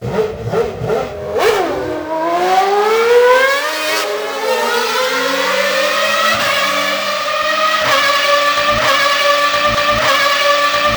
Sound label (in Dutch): Formule 1; Sound Effects Deel 1